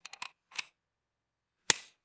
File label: metallic submachine gun magazine loading; guns